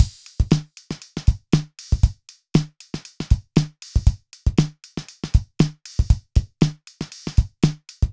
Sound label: Drumloops; MixterPlus